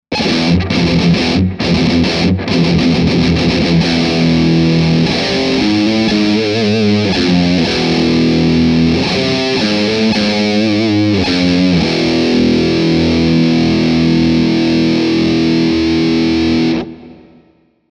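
Doom, Overdrive + Distortion, chitarra elettrica Kramer; Bridge pickup; OD Drive: 3/10; OD Tone: 5/10; OD Volume: 7/10; Gain: 7/10; Middle: 2/10; Presence: 8/10; Volume: 6/10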